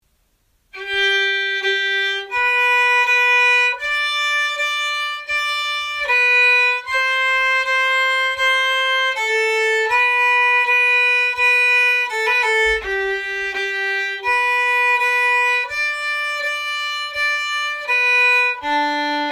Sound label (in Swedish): Polka Svanskog långsam; efter Anna Olsson; Allspelslåtar 2019